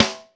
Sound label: Snare Drum